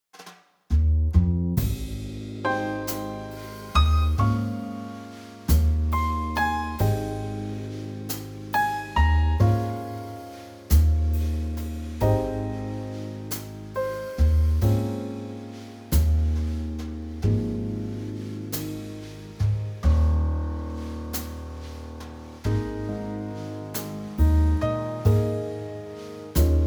Ab Trio

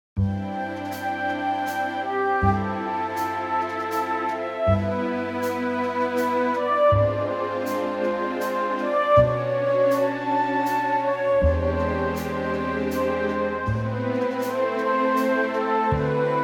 orchestral

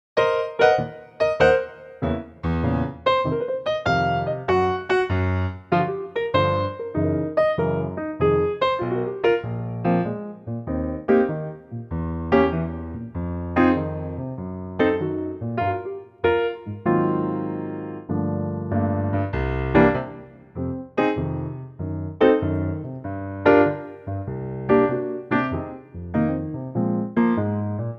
Eb Piano

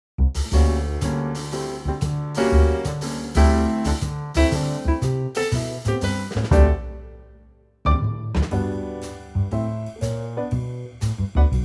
G Trio

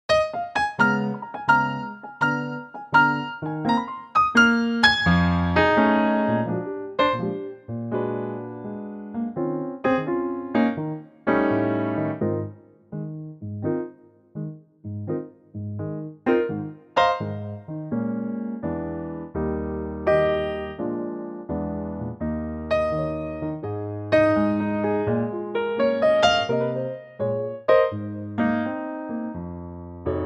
Ab piano, female

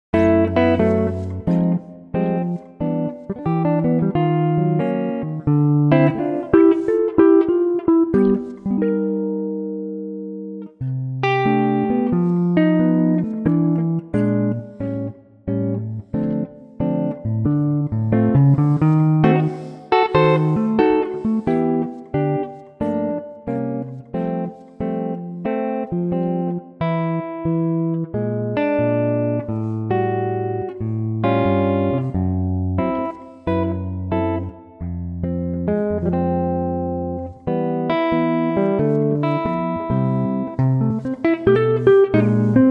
C Guitar; female

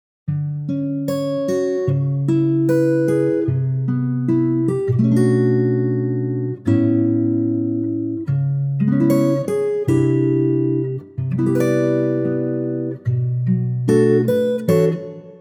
A acoustic guitar

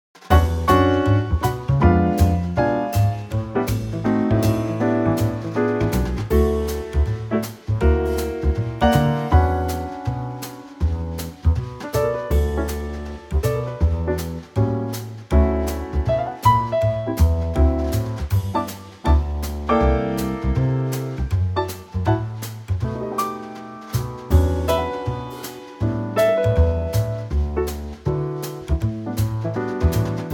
D Trio

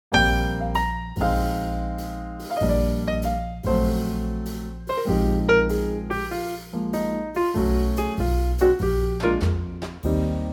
Trio Eb

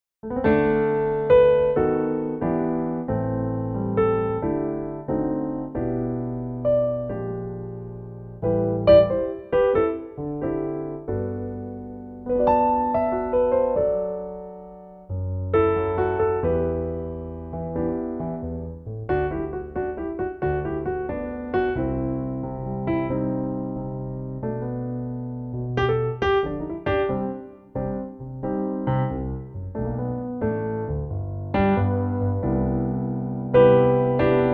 Piano

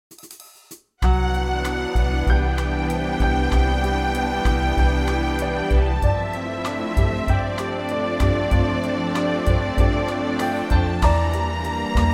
C orch

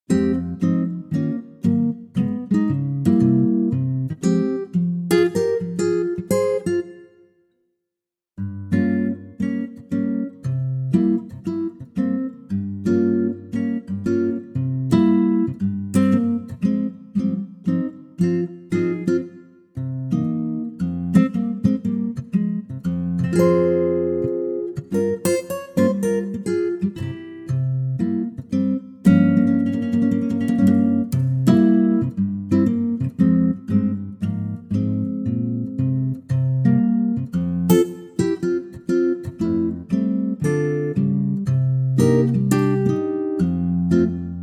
Fm acoustic Guitar

